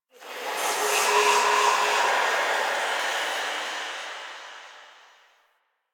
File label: Whisper Air Draft; Ambience; Warframe; The New War; Quests; Zariman